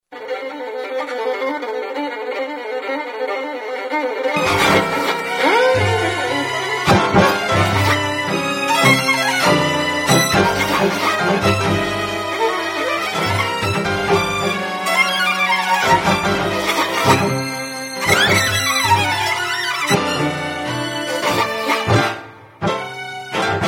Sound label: radio waltz thing, violin; satire; Vienna; ORF